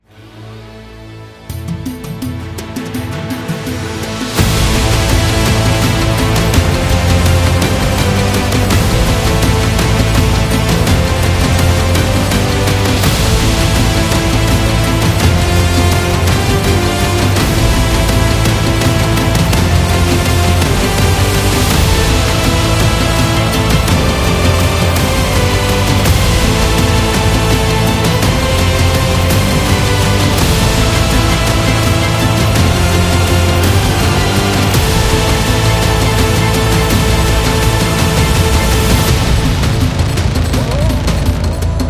Arabic Trailer

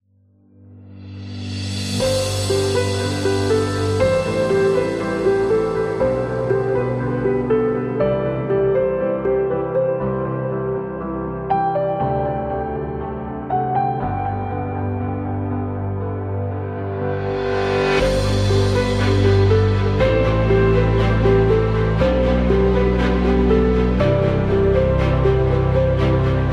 Cinematic Wedding

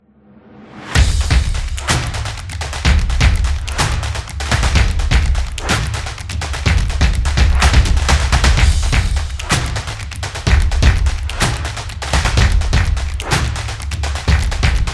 TV Show Opener, soccer drums

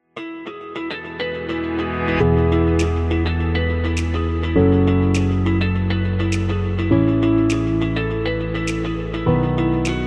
Calm

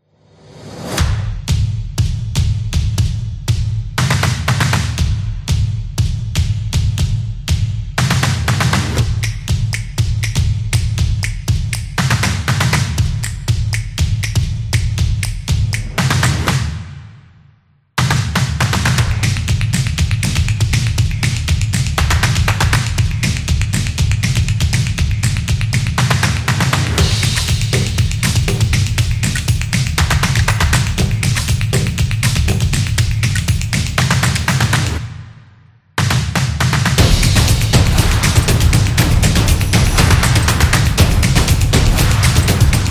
Intro TV; fast tempo

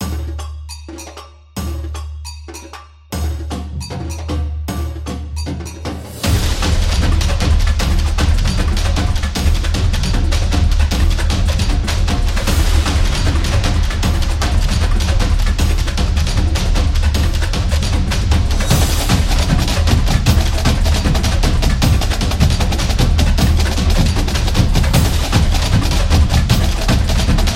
Brazilian

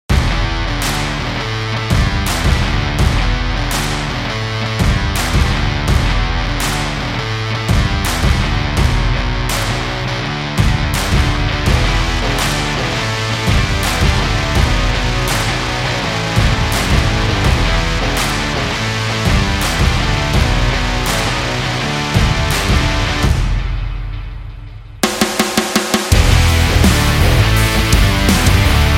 Blockbuster Rock

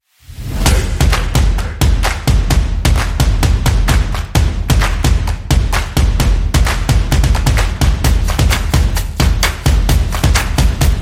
Energy Percussion Sport; action drums percussion

